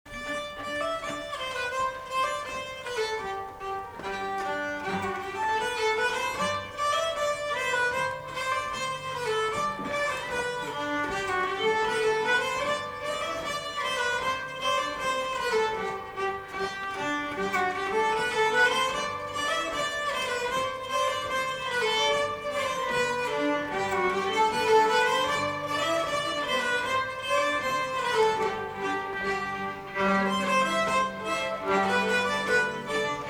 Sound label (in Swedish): Schottis; St Olof